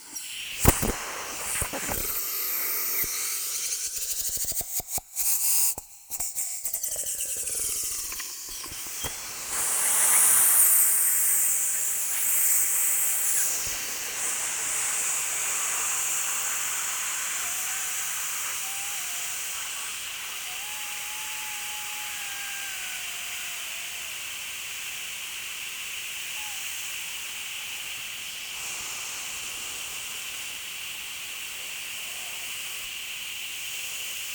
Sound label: Dynamic Vacuum Suction - Loop; The Sound Pack Tree; This Library Sucks